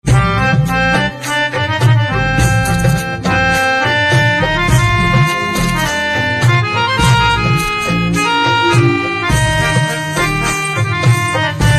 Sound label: Harmonium Instrumental Ringtone